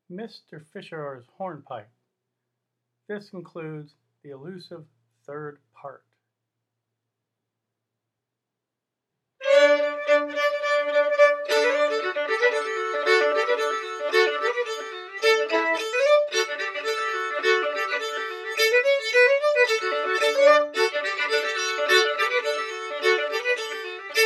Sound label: Hornpipe, fiddle; Fischars